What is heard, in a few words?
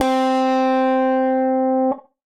Fuzz Clav; Piano & Keys; Ableton; Factory Packs; Chop and Swing